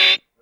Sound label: Hat lo Speaker, Stylophone Beatbox